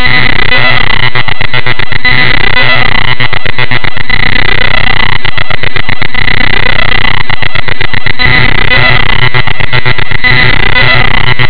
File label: bytebeat